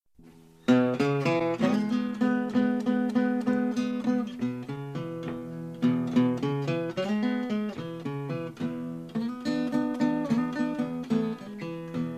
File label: blues